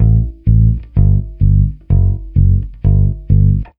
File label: Simple rock, Bass; ACID MUSIC